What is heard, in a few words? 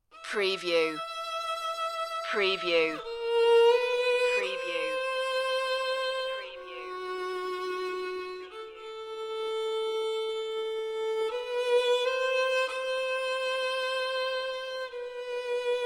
solo violin only